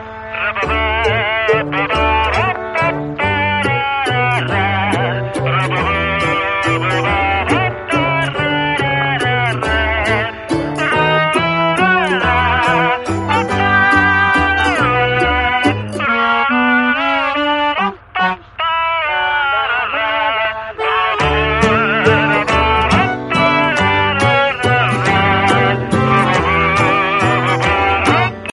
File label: kannada tune ringtone